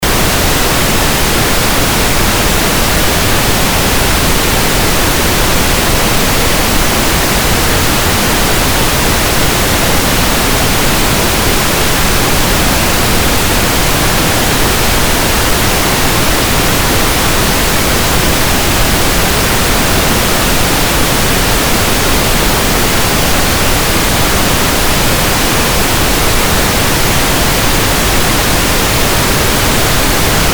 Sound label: pink Noise